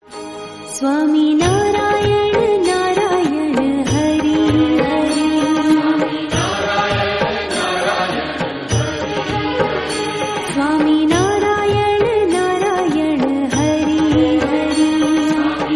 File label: swaminarayan narayan narayan hare hare